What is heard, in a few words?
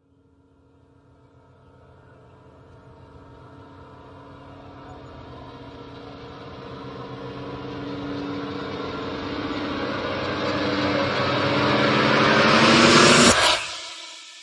Risers Expander; Gothic Storm Music; Advanced Sound Design 2012